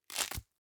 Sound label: velcro open slow; foley; smartsoundfx